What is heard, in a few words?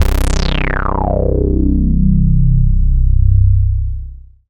Dance Floor Bass, Multisample Basses